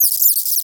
insect chirp vocalization; sound effects one

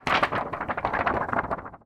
paper sheets many wobble fast shake; foley

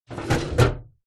office metal drawer close; zapsplat

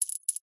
multimedia game sound coin collect

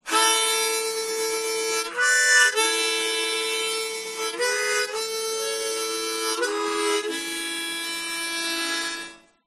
Harmonica Riff

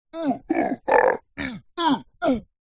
fantasy giant male vocalisations fighting

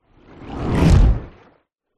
fantasy magic spell dark wet watery whoosh

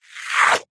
cartoon pull suck wet foam squelch; zapsplat

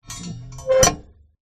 industrial lever metal old rusty pull

